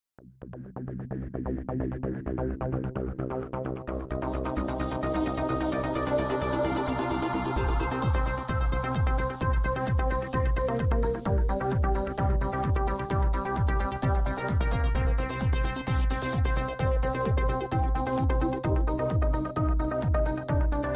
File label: music dance; red russian